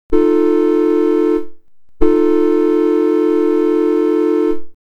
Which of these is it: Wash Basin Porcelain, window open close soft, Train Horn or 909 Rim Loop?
Train Horn